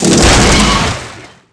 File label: smash wall; hits; Tony Hawk's Project 8; XBOX